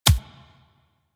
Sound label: Bullet Impact Hit Notification; Warframe; Weapons